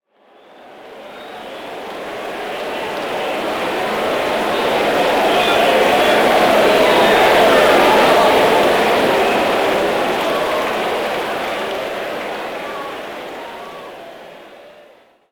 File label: Crowd Ambience; Pitch Black Audio Pong